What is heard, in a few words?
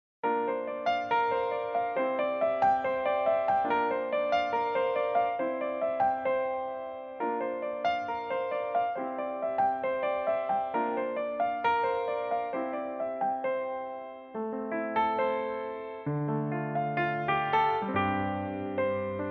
piano